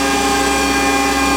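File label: Robot Arm Malfunction Loop; Warframe; Ambience; Corpus Infested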